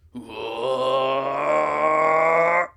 Nailmaster Long Roar

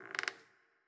Sarco Squeak

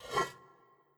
Jar Slide

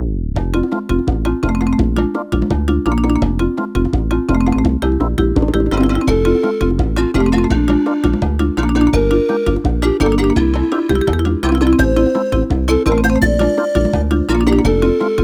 music shop, bpm84; Donkey Kong Country Returns HD

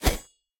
Slashing Short

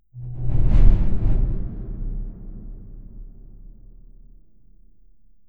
D R O P S A M B

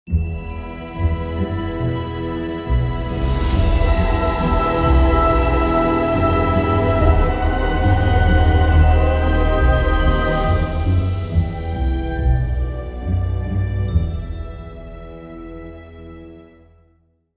Music BGM Cutscene; Wenjia; Cutscene 03

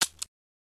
Out Of Ammo; unsighted

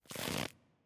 Amazon Attack Prep

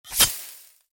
Knife; The Henry Stickmin Collection

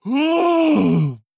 Yawns Goddess

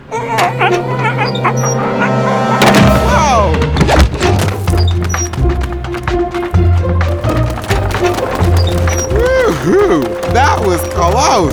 Outro Victory US; arthur and the revenge of maltazard; wii

